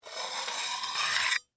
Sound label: Metal Panel Slide, Foley, Metal, Panel, Slide, Open, Small